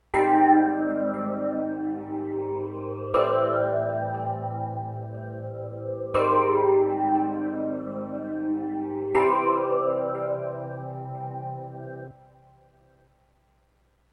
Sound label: vintage chords